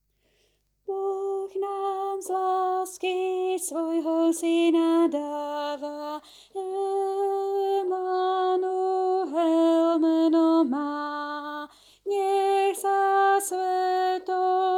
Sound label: Bass; Farnosť Letanovce